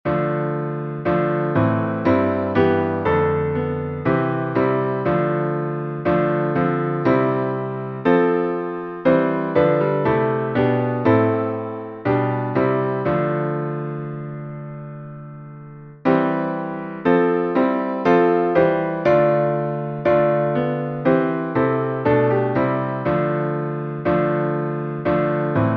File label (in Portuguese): salmo instrumental